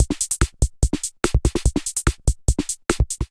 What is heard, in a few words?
Kit groove 145 bpm; MIDI Clips; D55 Lifeline Kit groove; Ableton; Factory Packs; Drum Essentials